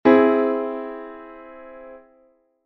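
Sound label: C augmented chord; ジャズピアノ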